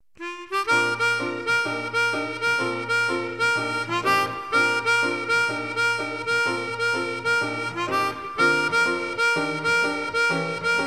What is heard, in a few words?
hip hoppin